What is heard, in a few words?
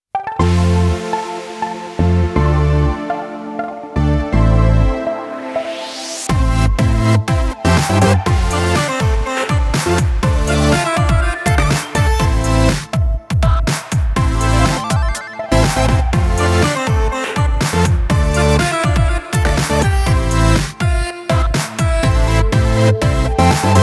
Music Fight; sayonara wild hearts 2019 gamerip